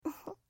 giggle

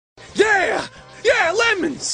yheehehe

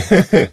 giggle; jhin

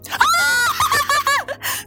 laugh

